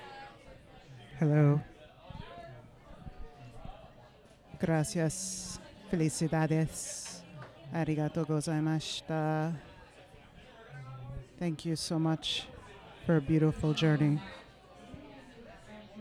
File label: Audience Recording; a-meal-premiere